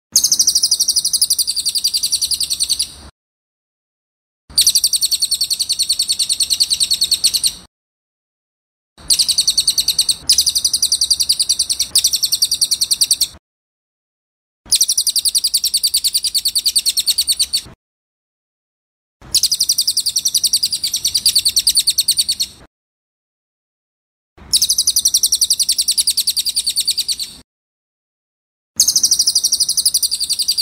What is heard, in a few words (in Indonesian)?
Suara Burung Cililin Nyaring Ngerol Asli Jernih Cocok untuk Semua Burung